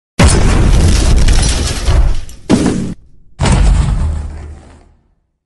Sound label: Car Explosion